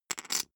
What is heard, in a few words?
poker chip stack small rattle